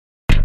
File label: tap